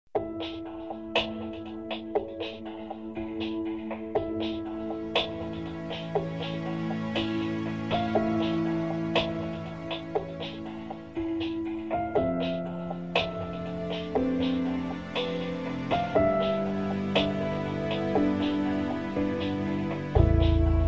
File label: Piano Moods beat variation